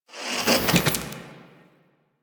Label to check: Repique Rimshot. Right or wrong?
Wrong